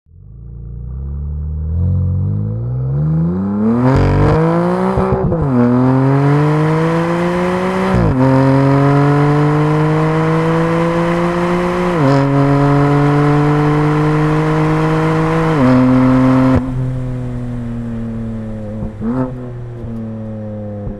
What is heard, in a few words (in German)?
Sound, auspuff